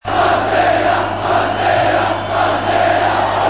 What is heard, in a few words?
audience